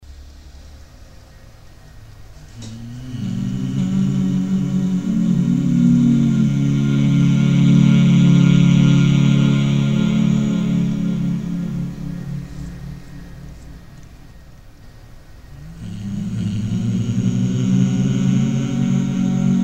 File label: testing the vocals